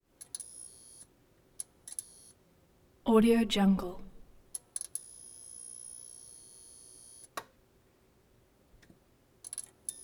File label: neon light buzz and flicker; collanderson